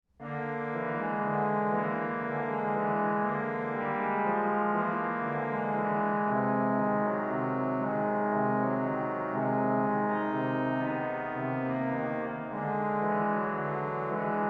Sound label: horn play, organist